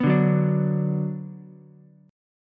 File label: B Minor, Ukulele; strummed; JGuitar